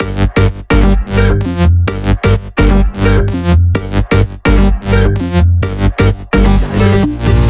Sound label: 128BPM Melody; Vengeance Samples; VFH1 128BPM Heavy Duty Kit